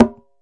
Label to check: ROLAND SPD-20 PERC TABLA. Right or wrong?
Right